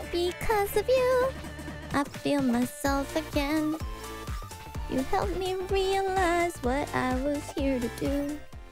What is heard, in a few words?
Singing; IRySinging